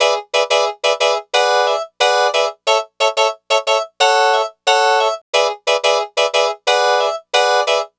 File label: Lounge Hrpsikrd-E; Zero-G Kocktail Kollection; Construction Kitz (Lounge); Welk Lounge 090